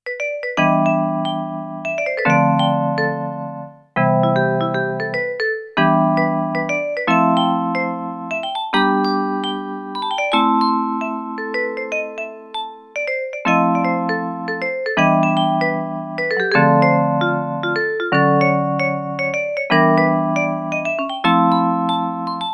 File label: Vibes